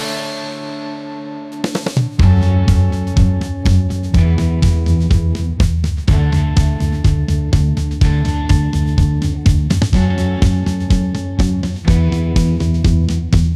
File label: energetic rock